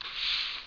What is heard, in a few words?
Steam iron; waterworld